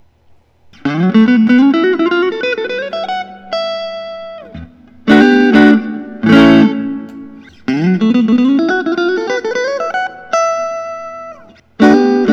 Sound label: melancon classic; spalt burst